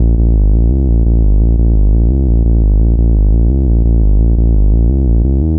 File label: Pure Analog, Keyboards; Sonic Foundry (Sony Creative Software); Leo Cavallo Bass Taster